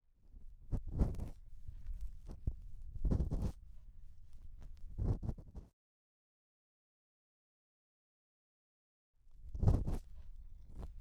(左)竹耳かき 耳奥ガリガリ音; 耳かき音部位分けVer.

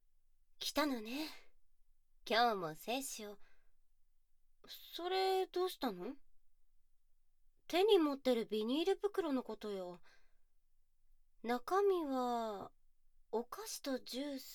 耳舐め 手コキ, ASMR

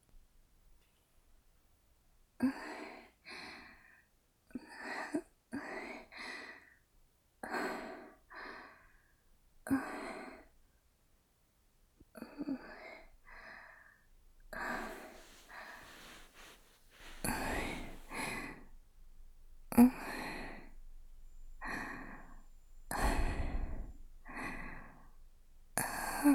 耳なめ 右

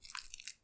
浅めにストローク, ピストン運動 激しめ; １ストローク単発

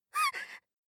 ひっ; 効果音なし

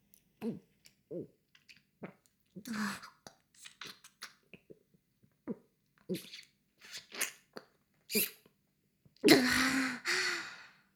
フェラ音声, ASMR; 少尉; 牧場戦腺

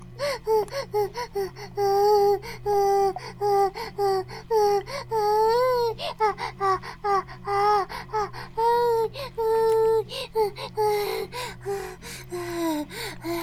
バイブ放置, ASMR

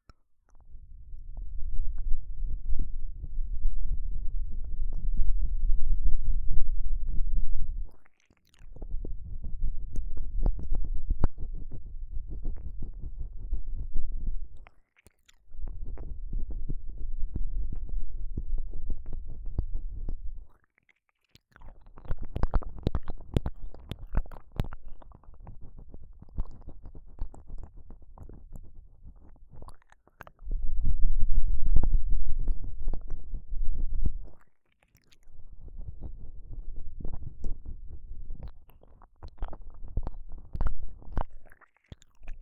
奥をひたすらゴシゴシ右

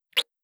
ちゅ, 効果音

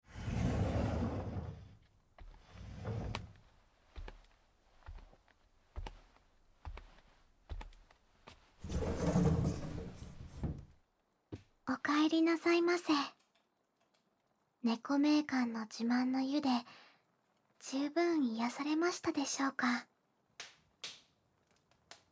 ねこじゃらし で 優しく 耳かき〜右耳・左耳〜

